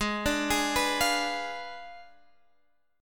G sharp Diminished 7th, Guitar, Standard; G#dim7; arpeggiated; x,11,12,13,12,13; down; capo=3

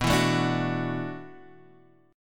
E 7th Suspended 2nd Suspended 4th, B

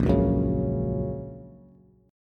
A sharp Minor Sharp 5th, down, Bass

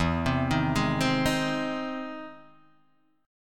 E Augmented Major 7th E down Guitar DADGAD

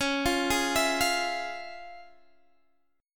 F Minor Major 7th Sharp 5th Db, guitar chord

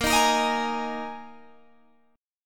Eb Suspended 4th

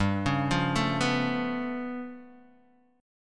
G 9th Flat 5th, Guitar DADGAD

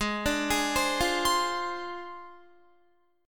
Ab 6th Flat 5th, Guitar, Ab6b5 chord; Ab6b5 arpeggiated; JGuitar

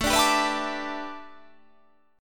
A 7th Suspended 2nd Suspended 4th

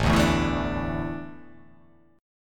A Suspended 4th A down, Asus4 chord; Asus4 strummed; JGuitar

